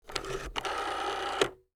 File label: rotary phone